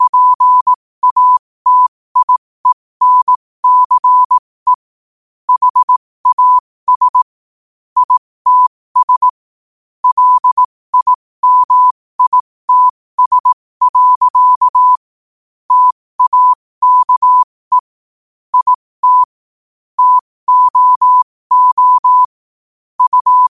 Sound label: 5 wpm, morse